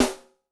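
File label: Piccolo Rim, Yamaha DTXpress-II snare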